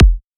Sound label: kick, drum hits; Anthemic